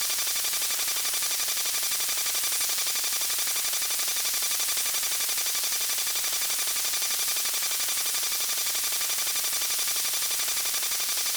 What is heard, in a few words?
dcc tape tones